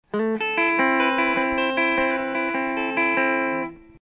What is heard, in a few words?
guitar